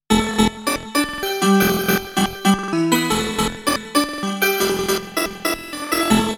micro prism riff with fx

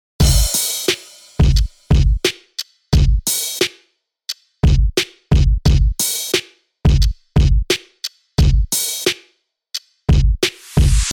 drum

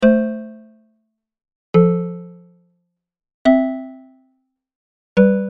Pg-8 pad no fx

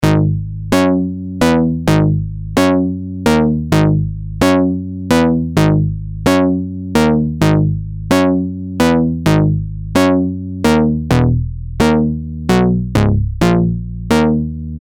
bass

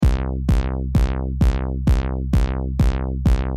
Lfo; Hammor Global

